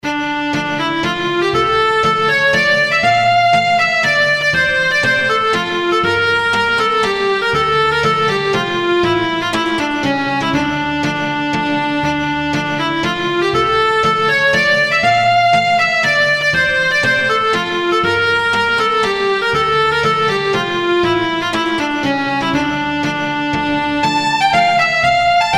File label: polska